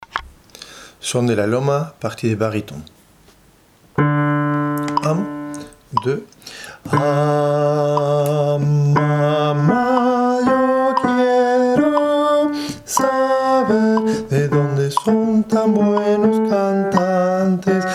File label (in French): baryton